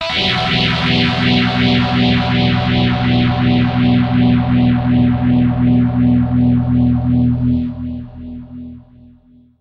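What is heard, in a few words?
dirty guitar stereo polyphase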